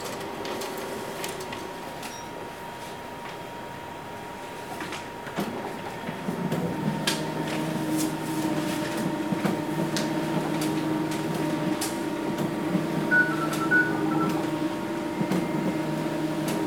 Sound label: Photocopying With Fax In Background